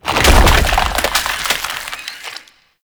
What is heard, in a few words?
Infested Lich Virulence Manifest; Warframe; Abilities; Zeke